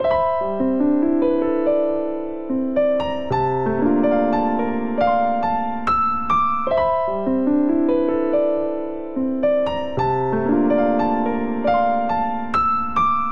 Ostinato Free Sideslip C Major 7th 72 bpm, Grand Piano; MIDI Clips; Ableton